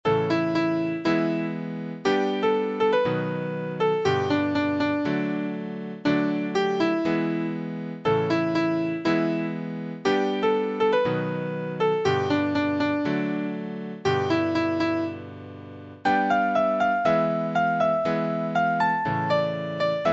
Piano

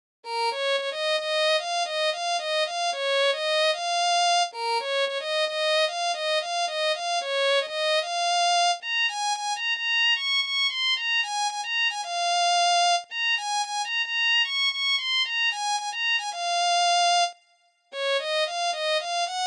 Violin

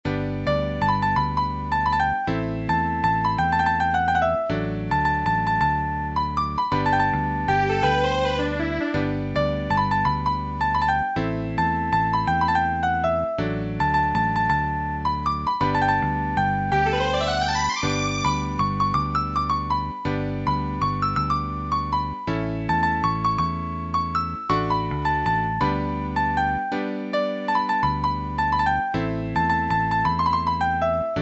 Piano

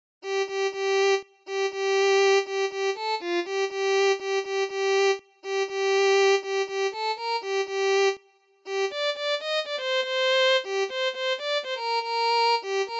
Violin

